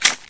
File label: 9mm cock, weapons